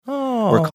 awww

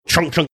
mimicking sound old fashioned pull tab machine

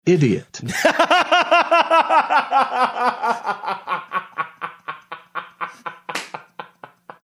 laughing to idiot drop

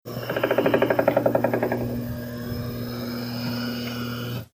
sound of foot and calf massager

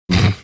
snorting

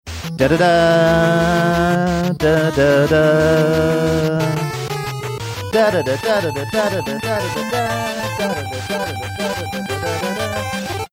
singing along; warming up with doc punch out

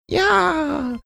mimicking scream

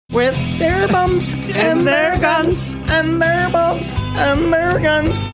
singing with their bombs and their guns

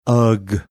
uggh

